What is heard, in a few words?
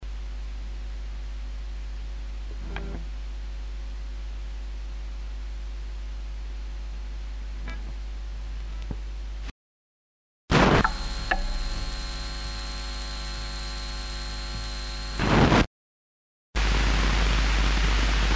JFET Buffers noise